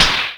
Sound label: slap